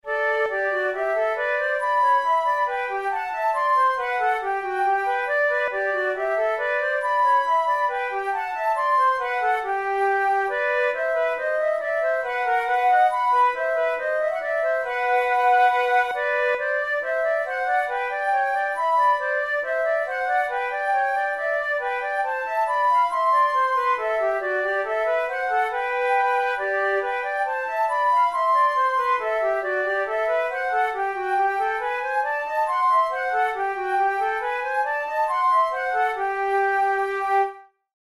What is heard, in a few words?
waltz duet, flute; mercadante